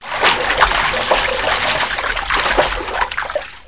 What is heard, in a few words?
swimming; How Many Bugs in a Box; juicebar